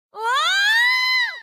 screaming; coco bandicoot

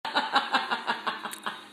laugh

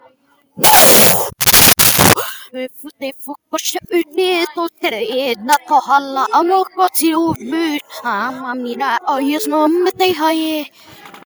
fart

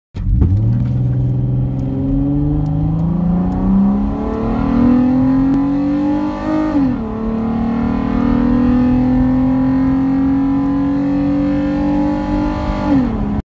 goofy ahh V6 engine

